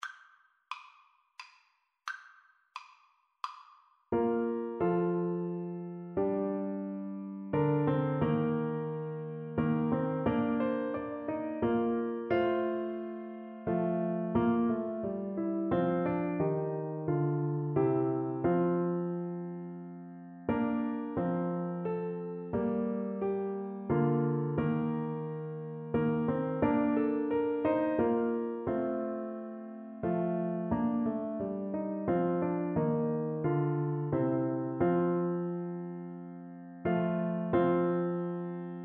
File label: clarinet